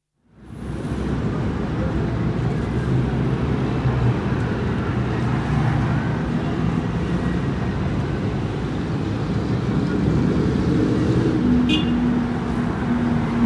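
Street, La Paz, with heavy traffic, horns, bells and footsteps; Outdoor; Bolivia; BBC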